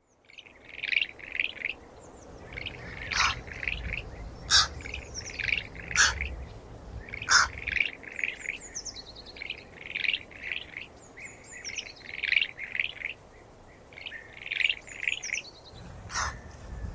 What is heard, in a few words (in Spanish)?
OSO HORMIGUERO; el mico manolo y el oso hormiguero